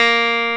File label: clavinet; E-MU Formula 4000 Series Vol. 3 – Analog Odyssey; VK+ Instruments